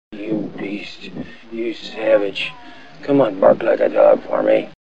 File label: Bark Like A Dog